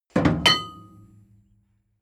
metal tool drop massive; soundpool

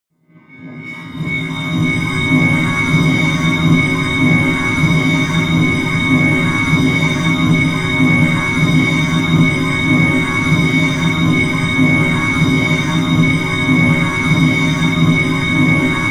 drone industry hallow high

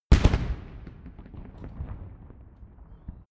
rifle salute fire

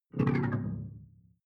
sci-fi alien big bionics down; swooshes-swishes

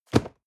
cardboard box medium place hard; objekte

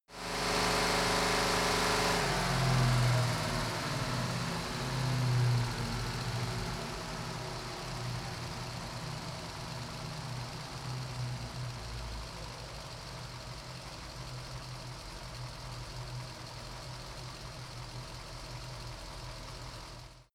car camaro slow down engine

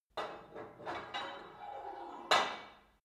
put light weight onto barbell soft, fitnessstudio; sport freizeit

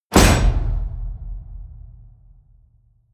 wham, whooshes

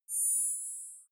zoom in long

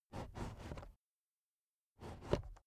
car headrest full adjustment soft unsteady

